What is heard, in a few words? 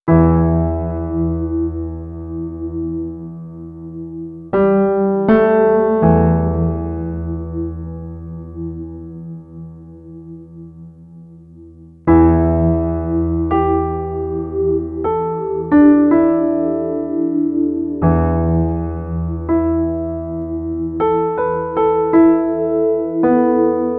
Piano, Electro Lounge; Sonic Foundry (Sony Creative Software)